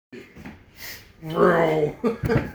vrroom

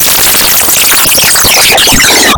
glitchy

